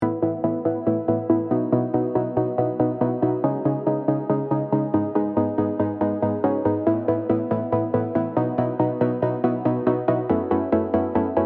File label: Future bass emotional